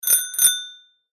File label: Bike Bell